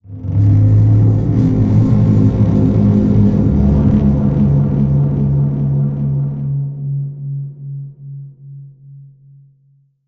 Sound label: citadel eerie, ambience